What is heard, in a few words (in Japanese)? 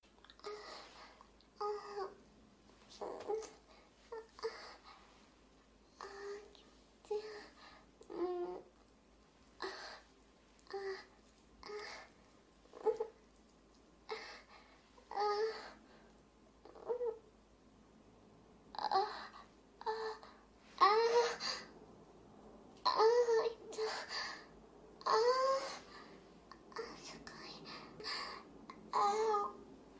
マンコを性欲処理に使われる人妻の喘ぎ声がこちら